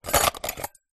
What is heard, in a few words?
household food mixer hand held detatchable whisks remove from plastic holder